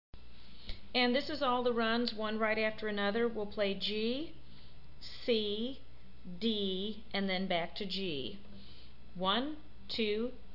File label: guitar G C D runs